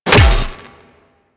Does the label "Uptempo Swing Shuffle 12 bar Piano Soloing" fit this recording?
No